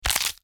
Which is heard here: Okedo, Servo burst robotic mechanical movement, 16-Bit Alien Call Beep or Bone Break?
Bone Break